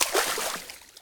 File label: spring land; Original TK Sounds